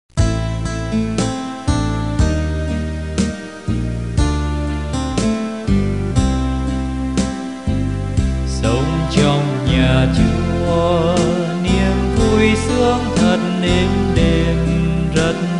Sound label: BASS